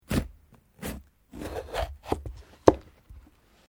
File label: Capsicum Slice, Food preparation; Food Prep (Sound Effects:Restaurants)